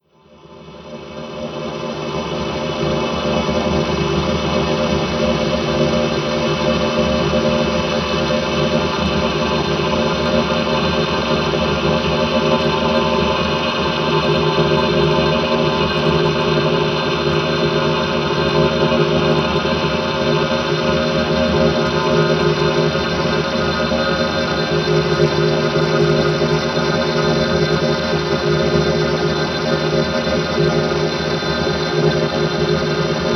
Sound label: choir